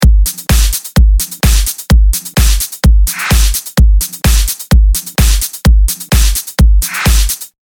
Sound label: electro house loop